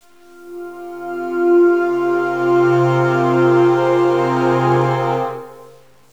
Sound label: SHORT MYSTIC 1; KillerTweaksII; DirectWave